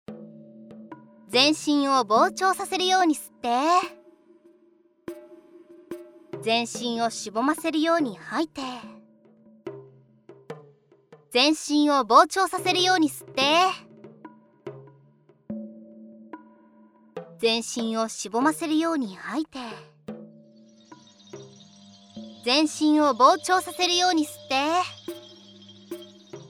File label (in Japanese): 全身呼吸速めループ用